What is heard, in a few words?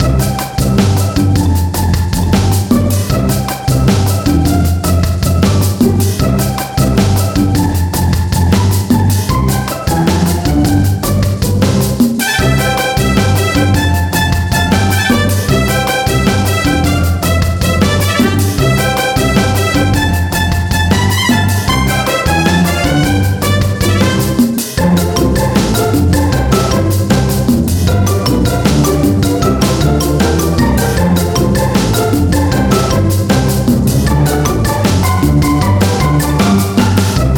Simon Says Music